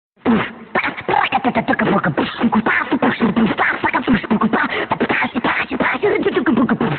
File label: Funny Beatbox